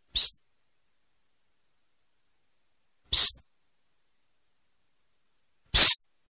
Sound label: psst